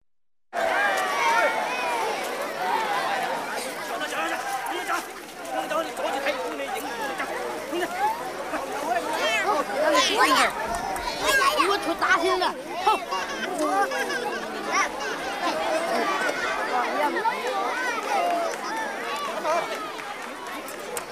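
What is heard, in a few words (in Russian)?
Китай толпа голоса дети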